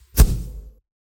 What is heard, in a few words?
Single Swoosh